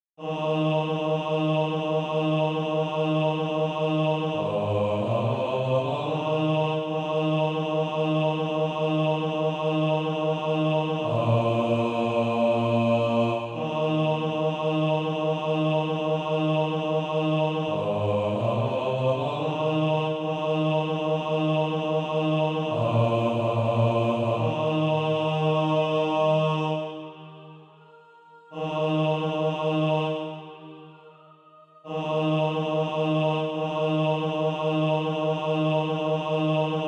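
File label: bass, SATB Practise Music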